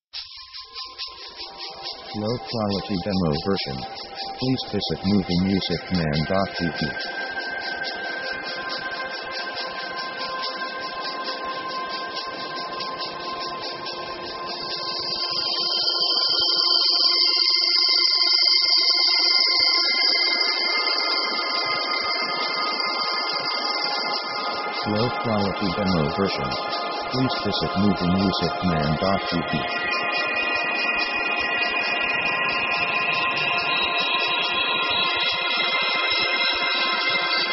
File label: atmo mysterious progression; Background themes